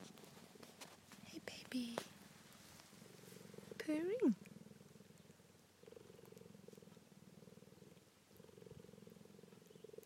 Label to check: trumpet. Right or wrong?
Wrong